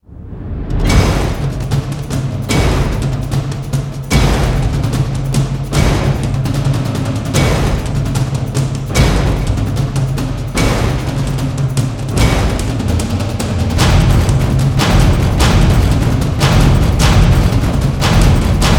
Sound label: Barrage Of Noise, Drums